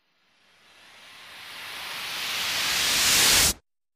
Strange Reversed Air Releases Vary In Pitch

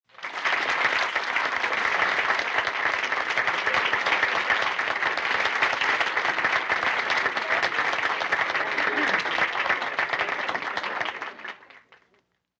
Applause Sml Theater

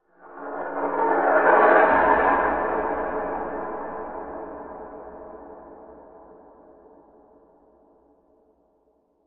Metal Scrape Eerie; ambience

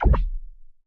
interface button, clicks

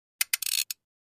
Flash Gear High Pitch, flash gear

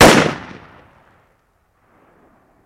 Ak47 Sngl Shot

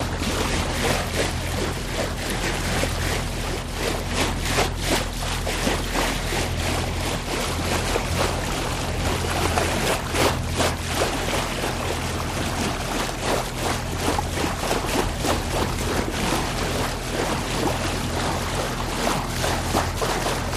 Bow Wash Stdy Light

